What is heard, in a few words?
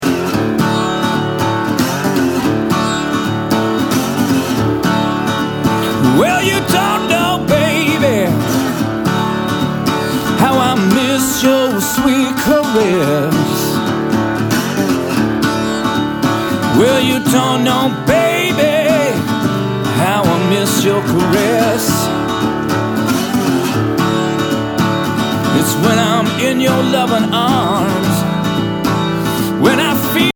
Acoustic